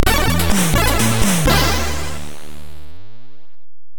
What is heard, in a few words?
Storm